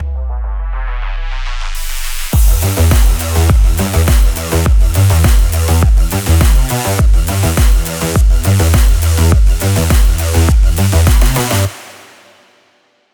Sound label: action sport